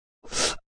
Man Gasp

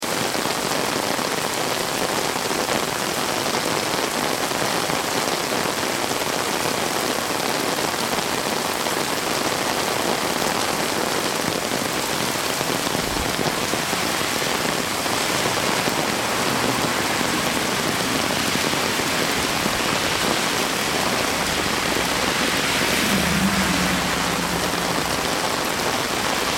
Rain On Umbrella Low Traffic Kyoto Japan; Rain on umbrella, Kyoto, Japan. Low level city traffic; Rain Sound Effects

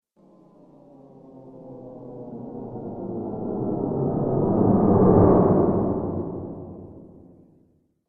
Ufo Rumble Transition

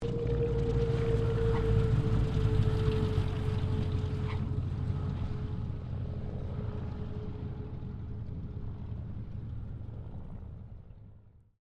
AIRCRAFT CA-13 BOOMERANG LANDING; Airplane Sound Effects; Airplanes (Sound Effects:Vehicles)

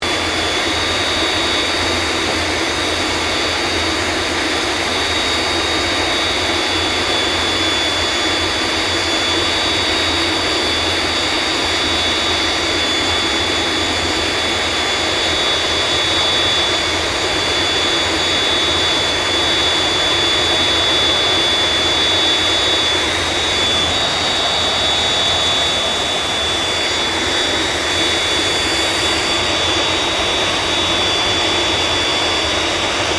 AIRCRAFT CA-27 SABRE IDLE; CA-27/F-86 Sabre; Airplanes (Sound Effects:Vehicles)

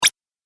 8 Bit Style Health Beep; Video Game Sound Effects

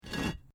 Brick Medium Hollow Move

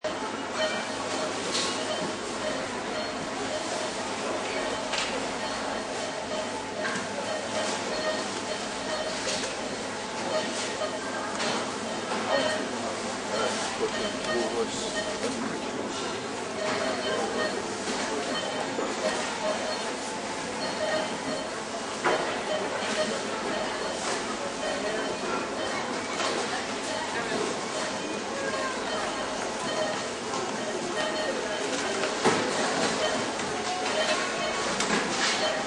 Supermarket Busier, busier shopping ambience; Supermarket sound, busier shopping ambience. Near checkout area with busier checkouts. Multiple tills beep when scanning bar; Lynne Music

